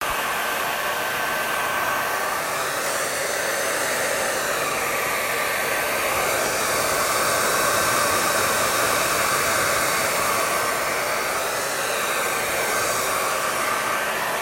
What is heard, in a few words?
Blow Dryer